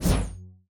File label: close combat wave sweep; CrossCode; battle